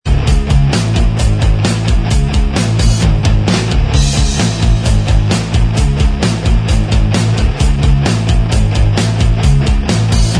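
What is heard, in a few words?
punked up instrumental track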